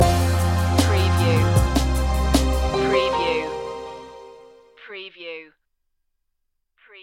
natural impulse stinger

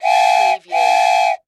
Toy Train Whistle Blow

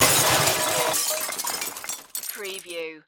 Junk Crash; Action, Impacts & Explosions

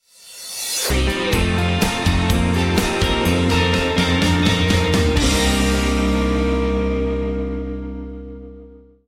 sugar coated stinger

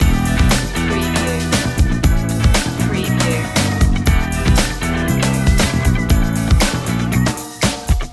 the funky one loop

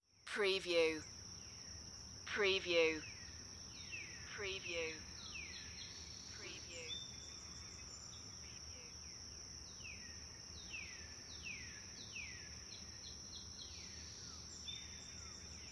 Forest Birds Chirp

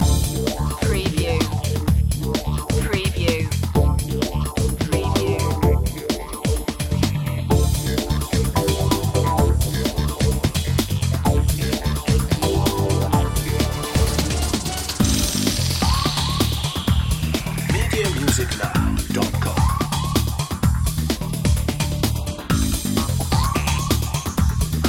funky loop

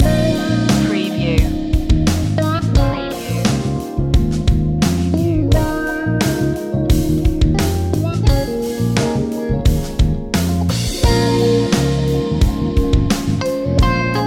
funky love loop

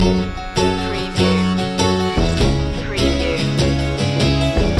circus loop

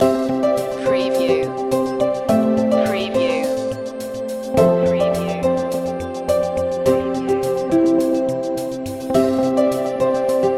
corporate heart

